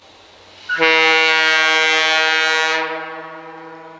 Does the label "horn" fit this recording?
Yes